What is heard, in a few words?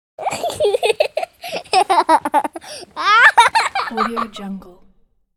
kids laughing